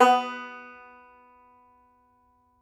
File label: Tenor Banjo